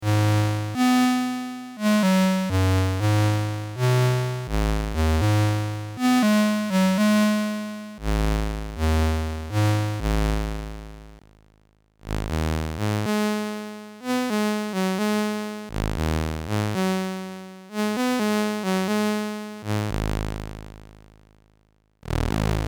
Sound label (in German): VCOs All Waves einzeln dann 2 3; system700